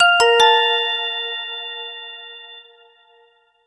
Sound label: notifier triple; Orange Sanza